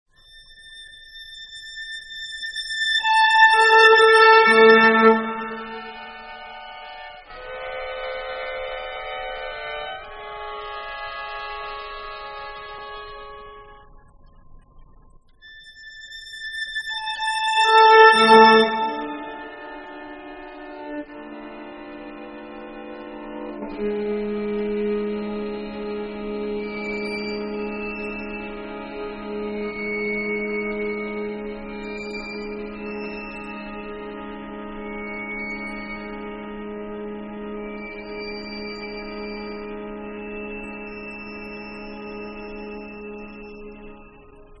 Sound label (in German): streichquartett